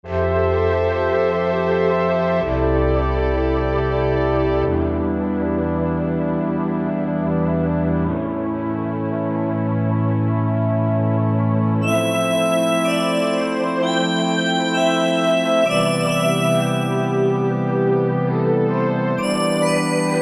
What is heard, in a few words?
Synth Pad, Korg MSC-1S; Korg MSC-1S Variety